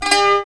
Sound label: BOUZUK; AMG; Now CD-ROM (Roland); Bhangra